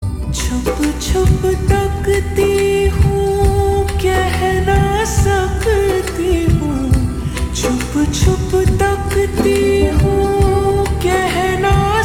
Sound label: Ringtone Slowed Reverb